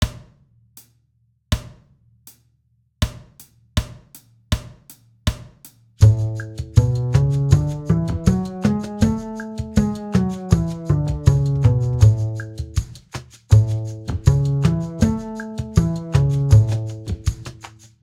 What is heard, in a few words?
Scales Concert A